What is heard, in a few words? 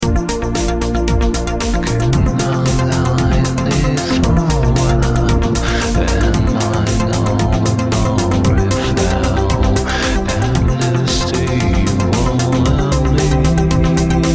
booing synthpop; UJAM